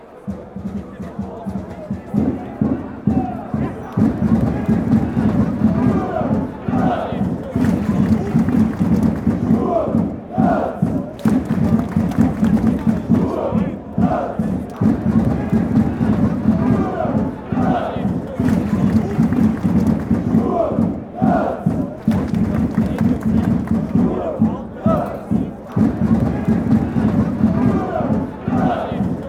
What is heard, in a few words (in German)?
sturm graz schlagzeug und klatschen, fanchants